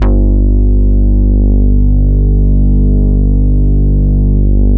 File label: LOW RES G1, ARP 2600; First Call Vintage Keyboards